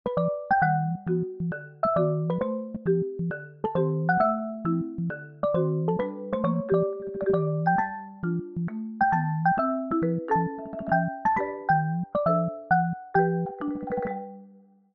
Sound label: Morning Xylophone; Samsung Galaxy Z Fold 7; ringtone; Sound Theme